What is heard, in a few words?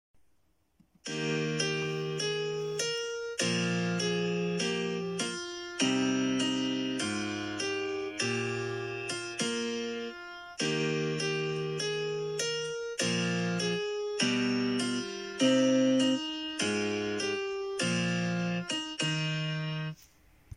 tune